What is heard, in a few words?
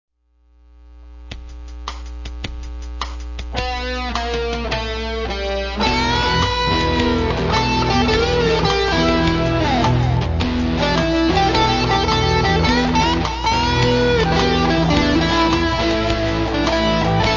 solo